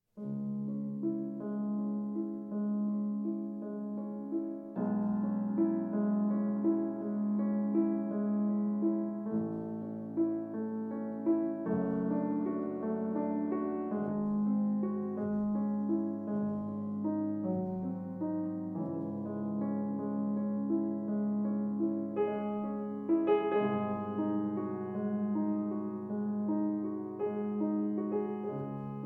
Piano Sonata No. 14 in C-Sharp Minor Adagio Sostenuto; Op. 27 No. 2 Moonlight Sonata I.